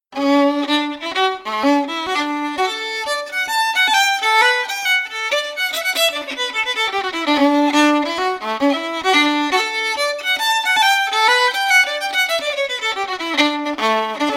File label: fiddle; willie hunters compliments